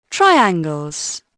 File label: triangle; Dorling Kindersley My First Incredible Amazing Dictionary